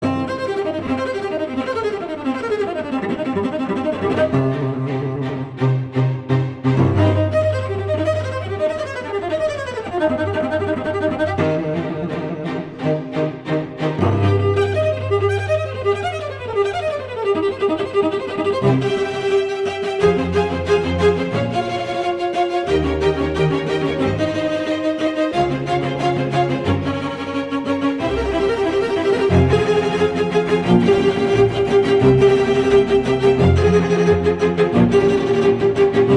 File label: plays cello; winter i